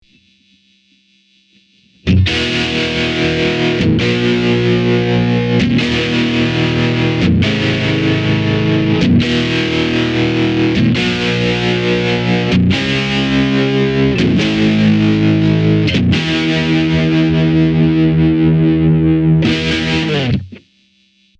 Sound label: ac30 with tremolo enhanced; vox